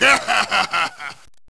laugh; zangief

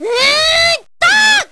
hmmpt aah; sakura

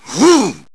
hooh; vega

